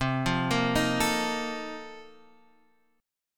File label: A sharp Major 7th Suspended 2nd Suspended 4th